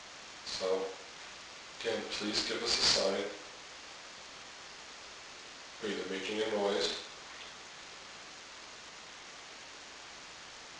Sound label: evp plink